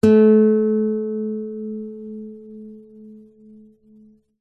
musical guitar nylon higher string pick stop finger